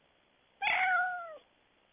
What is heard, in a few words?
cat meow